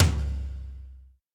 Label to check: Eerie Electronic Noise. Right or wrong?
Wrong